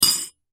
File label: Bowl Empty Drop, Bowl Empty Drop Spoon Clank Dish; Dishware; Household; Blastwave